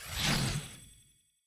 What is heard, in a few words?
Respawn